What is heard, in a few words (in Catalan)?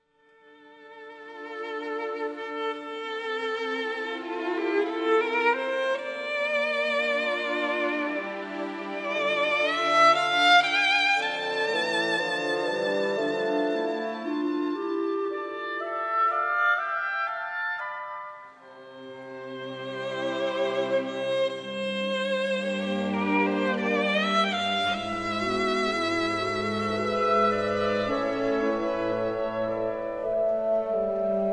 Violi I Orquestra